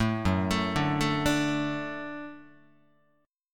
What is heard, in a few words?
Gb Minor Sharp 5th Gb Guitar; arpeggiated; JGuitar